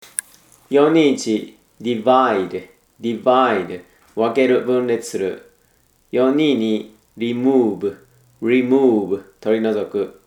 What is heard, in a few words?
tango